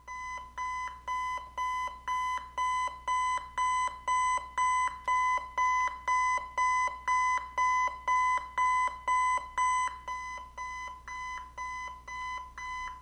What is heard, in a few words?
Alarm clock; Five Nights at Freddy's; FNAF 4